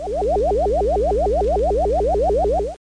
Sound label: Radar